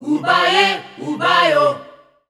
Oobaye Oobayo D 120, Voices Of Africa Sung Phrases